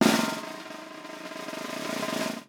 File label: Instrument Drumroll; LEGO The Incredibles